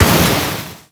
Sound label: Flare Gun Shoot